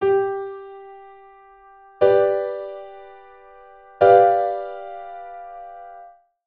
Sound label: Note Triad 7th